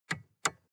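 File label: sports car interior lightswitch double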